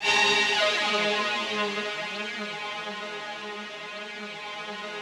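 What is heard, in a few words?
Yamaha VP-1, rough strings G#2; 90 s Sample CDs; Music Store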